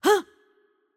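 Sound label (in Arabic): Attack Light, Arabic; ar AE; VO; bloom minigame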